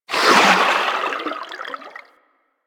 movement transition; Warframe; Vehicles; Sharkwing

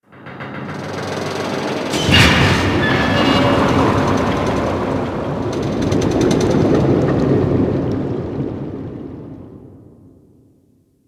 Ocean Metal Bubbles; Ambience; Warframe; Grineer Ocean

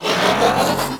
Ship Advert Bot Hover Start; Ambience; Props; Warframe; Corpus Ship Remaster

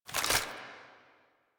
AK47 Reload Idle; Warframe; Tenno; Weapons

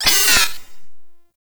Proto Infested Mutalist Pain; Warframe

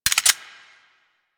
Electro Mag Mech, Weapons; Warframe; Corpus

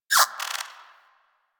Power, Akimbo Pistol; Grineer; Prisma Twin Gremlins

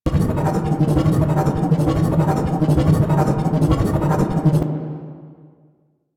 Boost Gravity Well Loop; Warframe; Nineteeen Ninety Nine; PvPvE

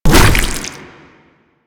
Tentacle Swing Hit; Enemies; Abilities; Warframe; Proto Infested Ancient

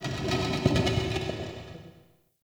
Champ Crypt Stone Movement; Ambience; One Offs; Champion Crypt

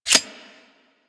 Heavy Shotgun Reload; Weapons; Warframe; Tenno

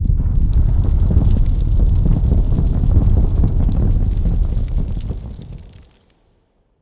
Music Minigame Statue Assemble, Player Instrument

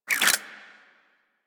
Hand Shotgun Prime Spin

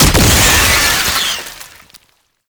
Infested Spore Pistol Explosion; Weapons

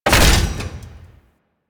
Anti Aircraft Gun Fully Charged; Weapons; Warframe; Archwing

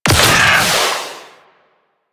Space Fighter Dredger Weapon Fire; Rail Jack; Enemy Ships; Grineer; Agent

